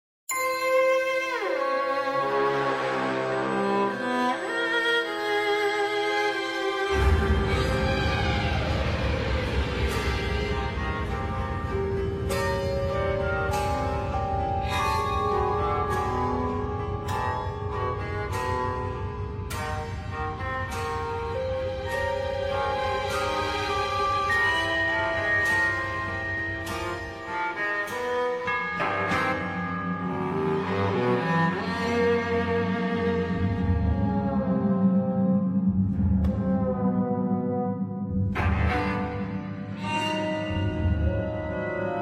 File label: Mystery Ambiance; Alice: Madness Returns unreleased original soundtrack